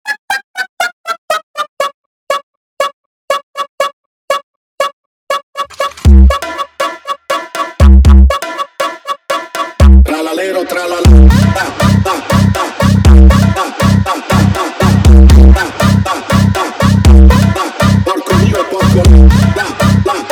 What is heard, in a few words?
Funk bass Boosted